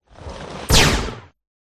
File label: ranged; allirog; Recode; rampage riches Desktop